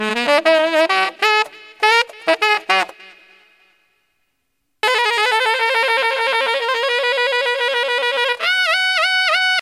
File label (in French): saxo